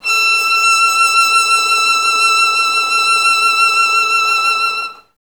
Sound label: E5, Advanced Orchestra; Best Service; Peter Siedlaczek's Advanced Orchestra